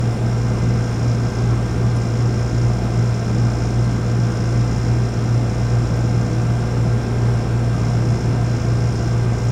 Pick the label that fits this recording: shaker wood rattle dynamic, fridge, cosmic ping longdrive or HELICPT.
fridge